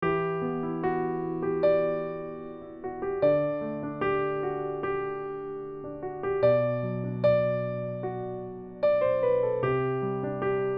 PIANO

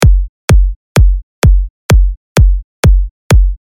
Progressive House

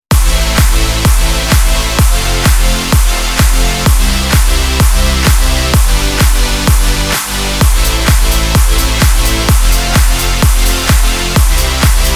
Beats

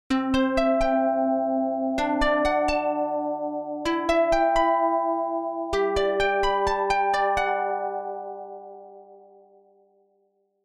Pluck arp

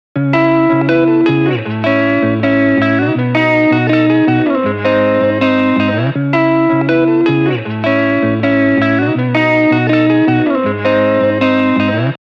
guitar wet vintagize

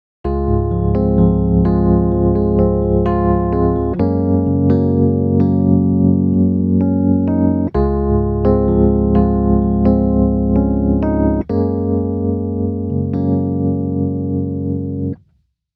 Rhodes Classic

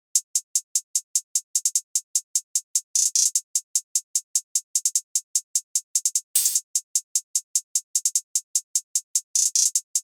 HiHat

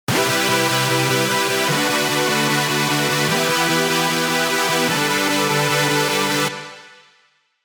Synth

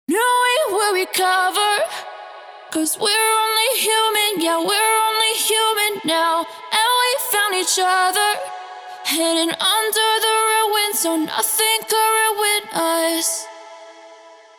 Vocal reverb

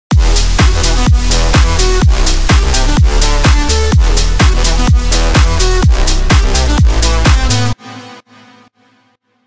Full Dwonlifter

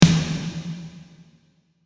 Snare Wet

